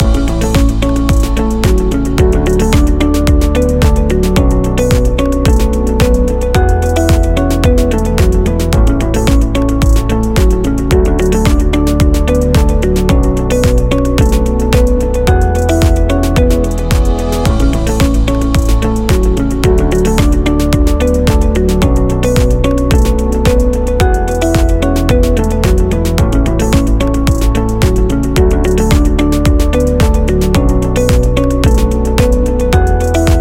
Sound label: Corporate Loop